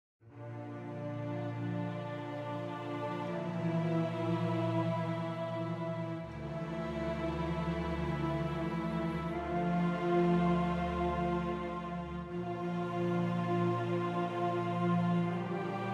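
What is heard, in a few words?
Music Cue Positive Effect; Crusader Kings III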